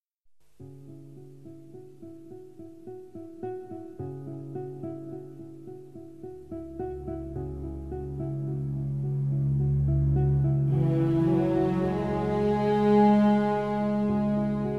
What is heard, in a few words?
Instrumental Music